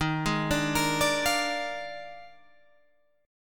Eb Major 7th Suspended 2nd Suspended 4th Eb Guitar Open G